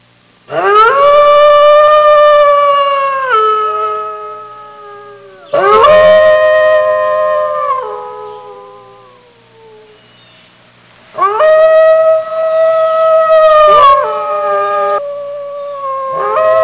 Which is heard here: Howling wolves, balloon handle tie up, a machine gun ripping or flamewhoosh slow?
Howling wolves